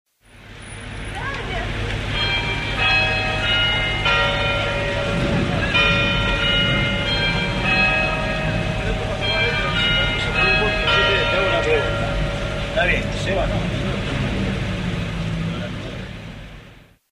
calles campanas; santa fe y sarmiento; p fuentes 21 mar 2006; Rosario

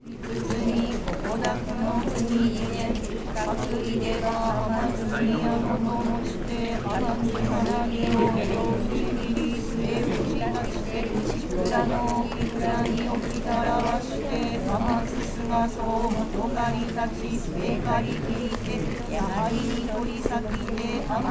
japon nikko santuario futarasan

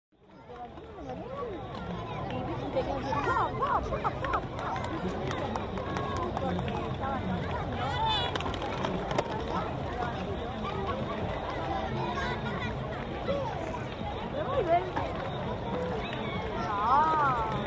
parques norte pista patin

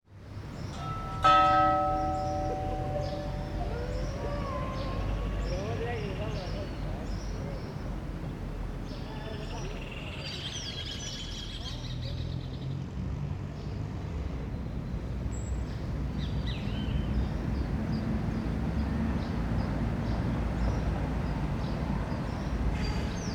plaza; firmat; rivadavia

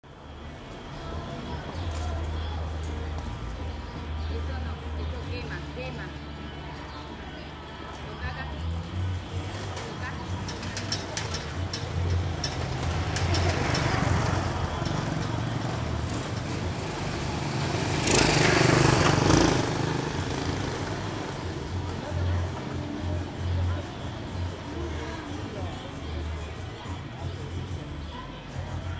calles; salva

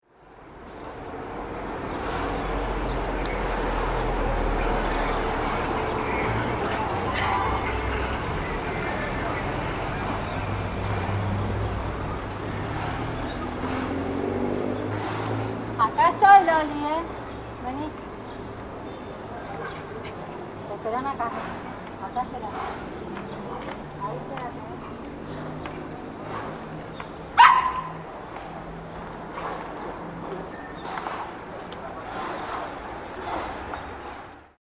calles rosario; catamarca y mitre 13 hs 24 oct 2007

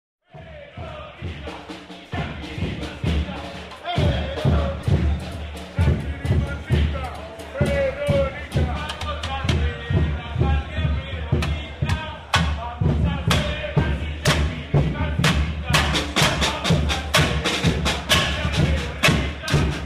actos dia del trabajador cgt rosario

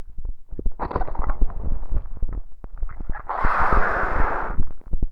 toma mate

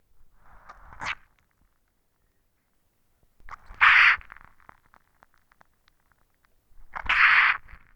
toma mate; Oído Absoluto

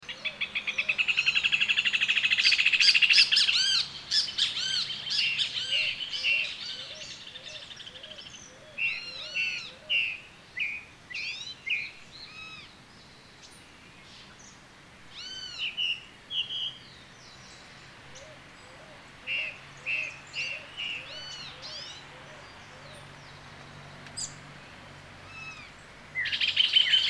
pajaros; funes; Escuchar Santa Fe

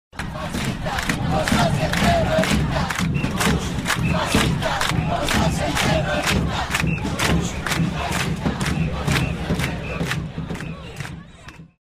manifestaciones repudio; bush

